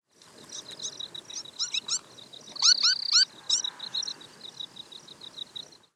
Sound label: Orange chat